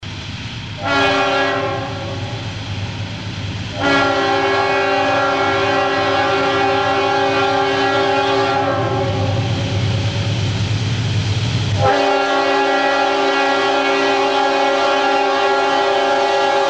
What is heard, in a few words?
train horn